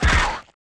Mushroom Atk; Seer; GamigoNA

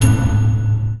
Item Put

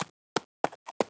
Moss Stag Walk; Seer; GamigoNA

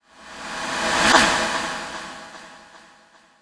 Water die; EmuClient

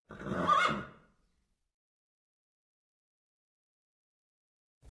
Pegasus Crying

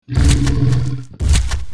Chimera Walk; Seer; GamigoNA

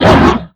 big yeti damage

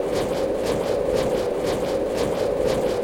Walk; Fargels; Gamigo

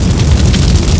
Run

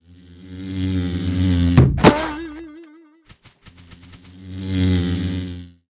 Killer Bee Action

